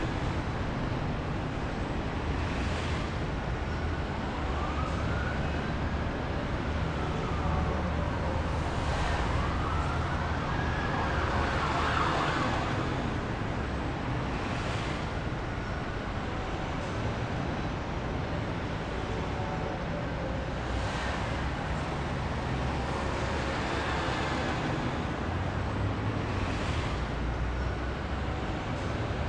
Ambiance Nyc

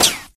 Bullet Impact; Pain Train

